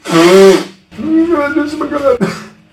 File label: blowing ones nose and caugh